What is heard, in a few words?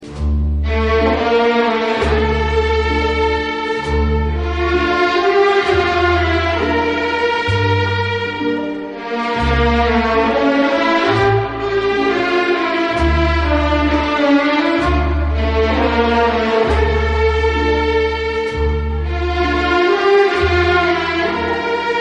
sad music ringtone